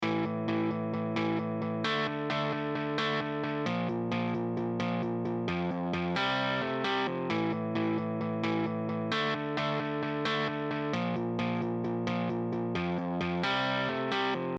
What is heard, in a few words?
guitar